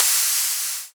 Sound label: Crash; Cymbals; Cymatics; EDM Starter Pack; Drums - One Shots